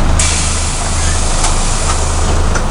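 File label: door open, vehicle; liaz5256 25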